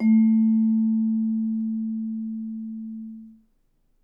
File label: A#3, Percussion & Harp; Miroslav Vitous